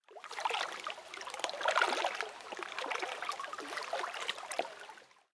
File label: croc swim; Immortal